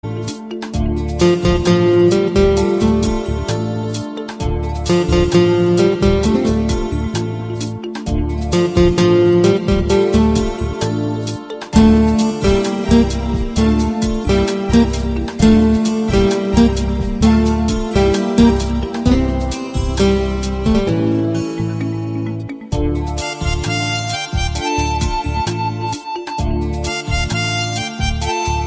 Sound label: melodic ringtone